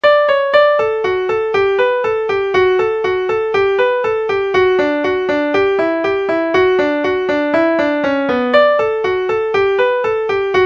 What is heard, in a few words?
english hornpipe, mandolin